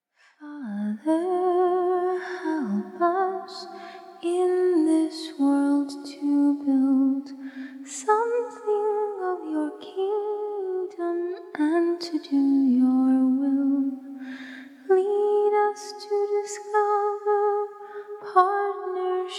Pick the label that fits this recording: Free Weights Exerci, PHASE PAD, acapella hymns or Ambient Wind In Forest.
acapella hymns